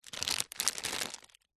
foley packet baby wipes handling rustle; zapsplat

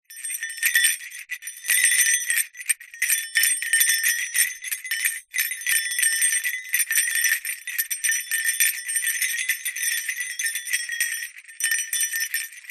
jester hat bells movement jingle; zapsplat; foley

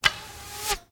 medieval scythian recurve arrow approach; still north media

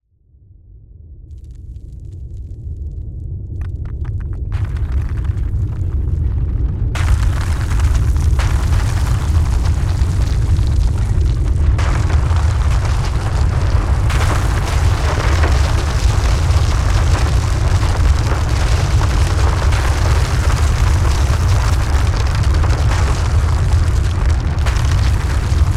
Earthquake With Debris; Blastwave FX

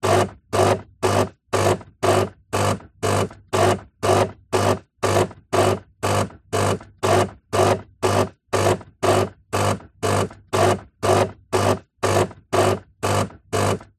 coffee machine delonghi rinse cycle

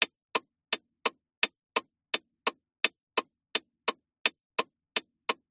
car indicator blinkers click internal med; transport

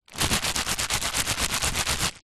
packet empty frozen veg rub rythmic; foley

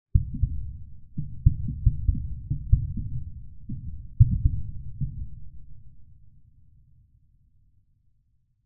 bombs very distant cluster of explosions

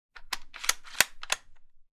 gun mosin nagant cavalry carbine cock; sound effects one

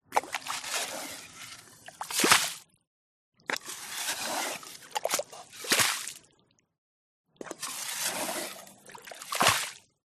yabby pumping liquid mud sand water; Alexander Gastrell

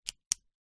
Bic Pen Off

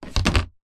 tub plastic lid set down on tub; foley

